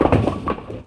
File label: bowling; ninepins